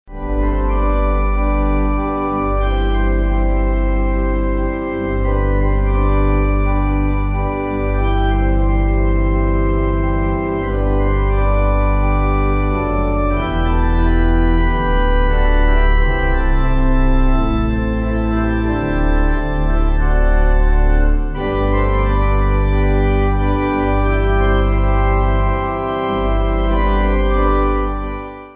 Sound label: Pipe